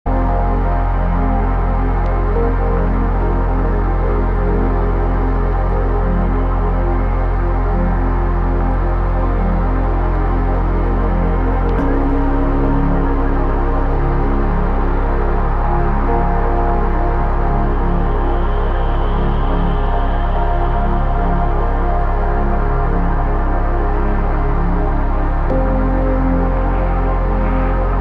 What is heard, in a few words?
pop ambient